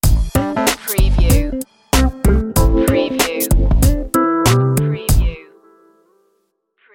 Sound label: funky summer stinger